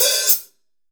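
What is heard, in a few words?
14 hi-hat, Real Mega Drums, dry kit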